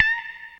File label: DIT GTR, Funky GtrLicks; E-MU Formula 4000 Series Vol. 1; Hip Hop Nation